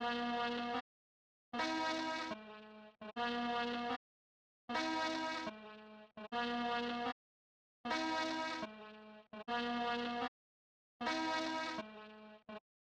76 BPM G# Min Synth; Trap; Loop Stems; Cymatics; 2022 Melody Collection; Trap Pt 1; Balenciaga

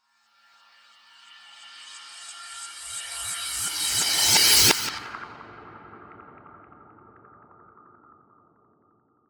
Riser

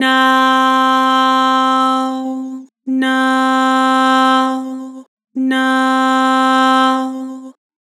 "Now", Harmor Vocals

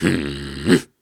Snarls and Hums, Viking Vocal

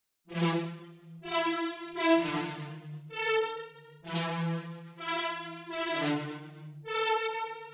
128 BPM E Min Lead; House; Drop Loops; Loop Stems; Cymatics; Loud

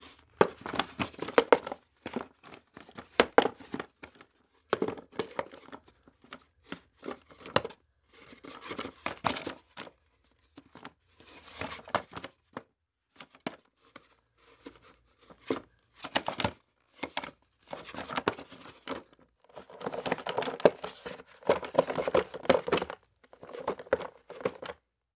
Skateboard Noise; Fairlight Sound Lib

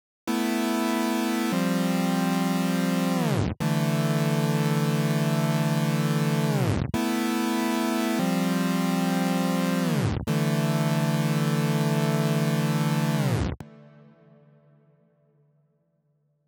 144 BPM A Min Saw, loop stems; Melody; Cymatics; Cymatics - 2022 Melody Collection - Wavy; Zoo

